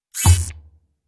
Magic Wand Zap, zaps

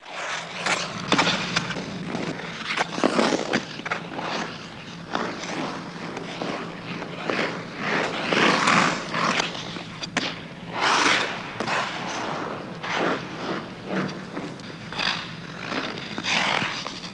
Ice Skates CU Half Spd; ice skating

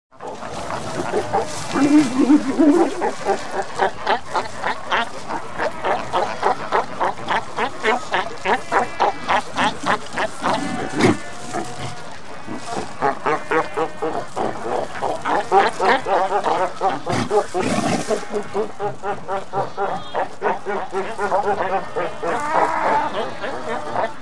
Seal Cape Fur Barks, seals & sea lions

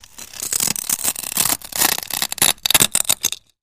Glass Grinds Creaks; windows & mirrors

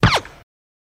Machine Gun Silenced, silenced bursts

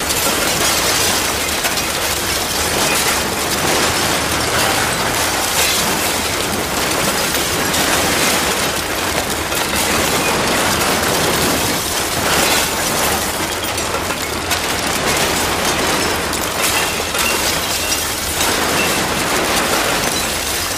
Mass Destruction, demolition

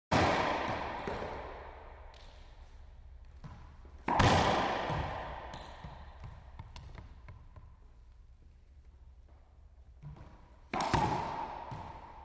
Racquetball Being Hi, racquetball

